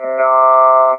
TB NAH, Talk Box

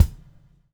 BUBBLE; Spectrasonics - Backbeat

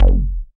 K-2000, Synth Bass; BA1 Synth-Basses; Sound & Vision - Gigapack I CD 2

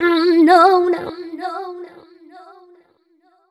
f 128 mel e, fem Vocals

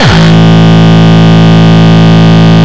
Longkick, kicks

